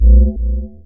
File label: spooky jungle bass